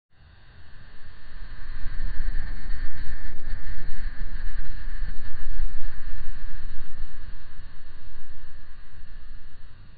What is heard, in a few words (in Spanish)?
susurros volcnicos y ca, volcanic